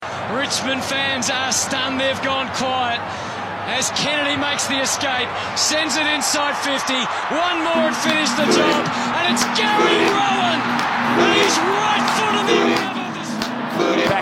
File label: footy gospel theme song